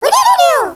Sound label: wodododo; DS; ML BOWSERS INSIDE; Fawful